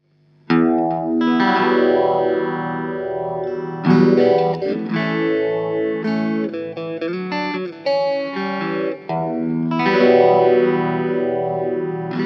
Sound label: moon breathe